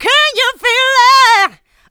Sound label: Female Vocals; Houseworx